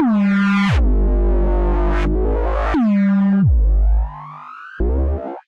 Anarchy Reese F Minor 175 bpm, Bassline; MIDI Clips; Tonal; Skitter and Step; Ableton Factory Packs

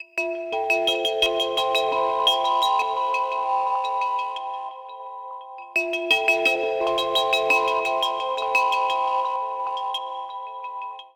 Bell Delay A Minor 86 bpm; Melodic; Tonal; MIDI Clips; Drive and Glow; Ableton; Factory Packs

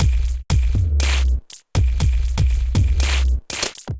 Kimbo Kit, drums; Hybrid; Ableton; Factory Packs; Drum Essentials

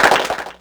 Clapbox Pitch 6; Ableton Foundation FreePacks

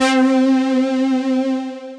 Delicate Drama, Synth Lead

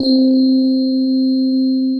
Vibewave, Synth Keys; Ableton; Glitch and Wash

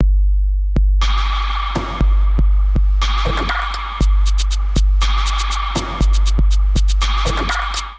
Kit 120 bpm, Drums Basic; MIDI Clips; Aero Kit; Ableton; Factory Packs; Skitter and Step

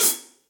Hihat Pedal Big Punchy; Drum Hits; Standard Hits; Ableton; Factory Packs; Session Drums Club

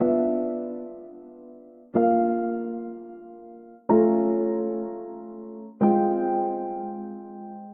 Quaint Piano - Bmin 124 bpm; MIDI Clips; Tonal; Motifs; Ableton; Mood Reel

